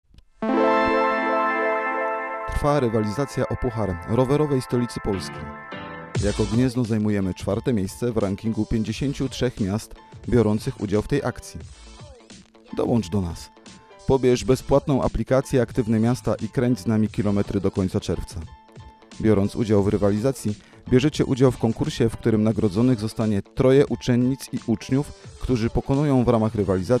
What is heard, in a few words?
vocal